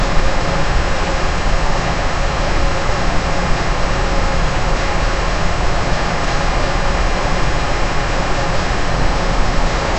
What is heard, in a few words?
ambience soft humming crystals